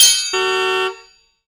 Enemy Train Signal On

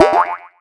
Sheep Jump

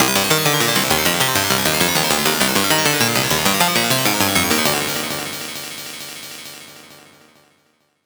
Fill Arp Glitchy 200 C; exciplex sample presets pack; JinoBeats

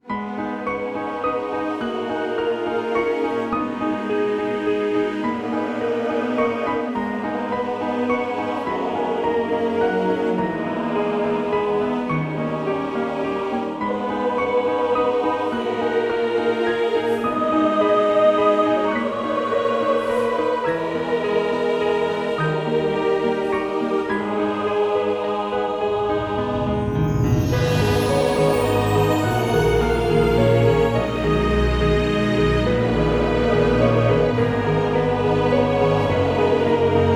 theme inside cathedral; Cris Tales; PS4 PS5 Stadia Switch Windows Xbox One Xbox Series X/S

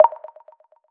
Start

